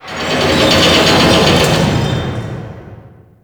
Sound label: Ocean Machine; Ambience; Warframe; Grineer Ocean